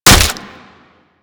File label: Barrage Hit Flesh; Warframe; Warframes